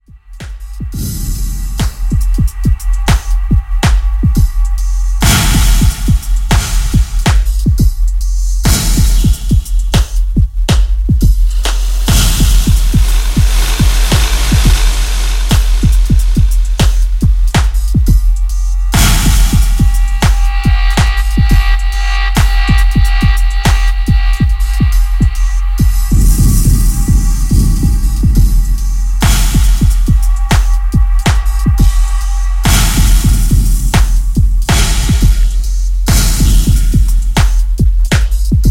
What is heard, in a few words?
Footsteps Dub